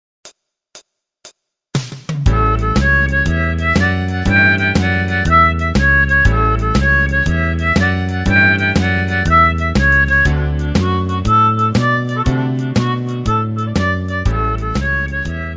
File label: harp